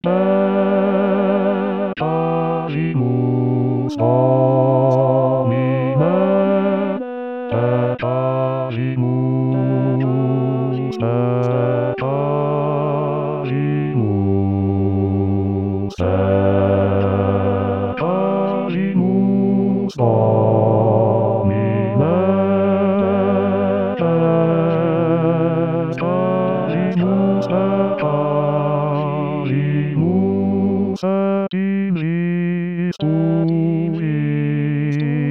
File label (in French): Basse1 renforcé; Carissimi; Jonas